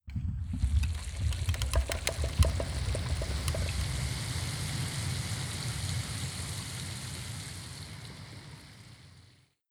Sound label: open floodgate; Kismet